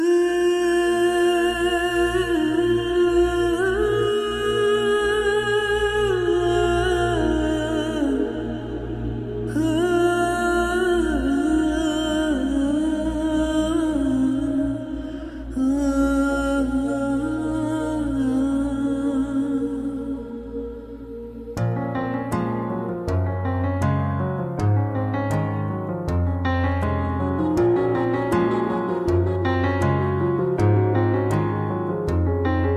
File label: Title Song Ringtone; Akser Movie